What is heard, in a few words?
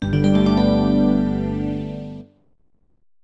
Jingle rest; virtua tennis world tour psp gamerip 2005